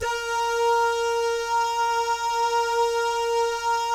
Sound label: DAH, Vocal Jazz FX; Spectrasonics; Vocal Planet; JAZZCOMBO